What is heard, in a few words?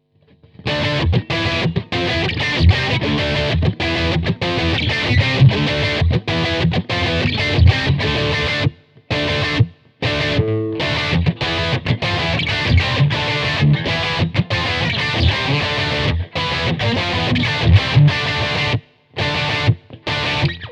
gainy